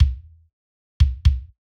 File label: kick EQ